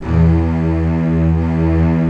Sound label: ARCO STRINGS; OMI - Universe of Sounds